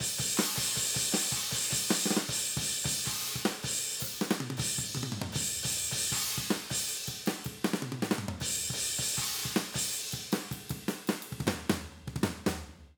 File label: Overheads No Gate